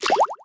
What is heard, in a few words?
Bubbles Bloop; game rip; Lego My Style Preschool